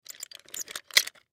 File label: Wheel Turns Metal, Wheel Turns Metal Squeaks Short; Foley; Auto Shops; Blastwave